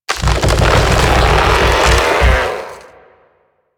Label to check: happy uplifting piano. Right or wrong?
Wrong